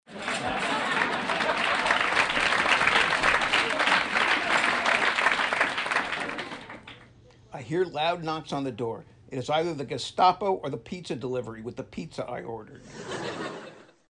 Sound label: Laugh Track